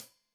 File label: Hat Tight, Hi-Hat, Cymbals; Deeply Sampled Drums